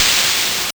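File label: ChipShop GB Open hat; DRUMS; HATS; ChipShop Deluxe; ChipShop Hits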